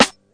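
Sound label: classic hip hop Snare; Classic Hip Hop Mega Kit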